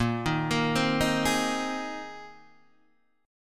Bb 7th Suspended 2nd Suspended 4th Bb, Guitar Open D